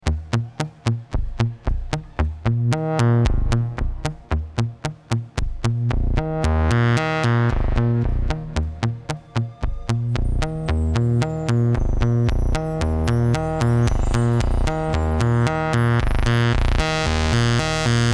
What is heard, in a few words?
Modular Improv